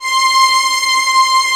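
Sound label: Vio legato C5; Digital Sound Factory Virtuoso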